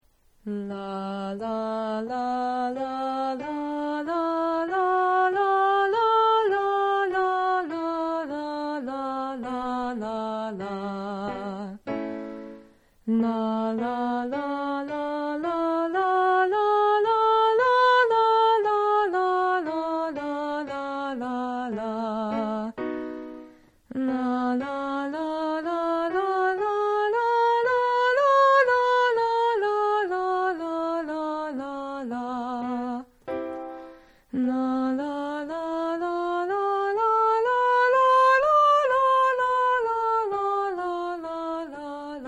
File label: 9 note scales